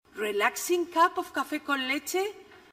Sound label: tone relaxing cup; ibuttons